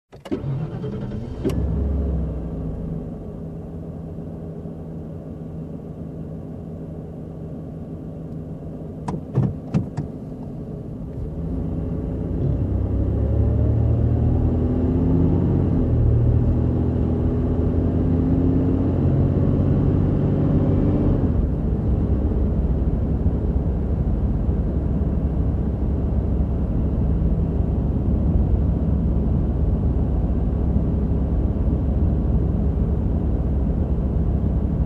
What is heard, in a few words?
Car Engine ignition and drive around